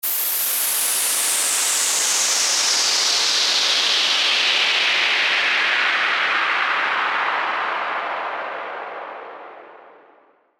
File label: WHOOSH